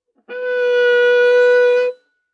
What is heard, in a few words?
Viola arco ff sul C B4